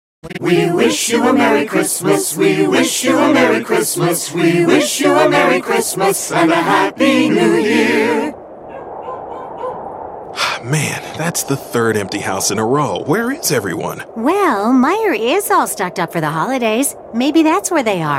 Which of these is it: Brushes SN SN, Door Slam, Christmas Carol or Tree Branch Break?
Christmas Carol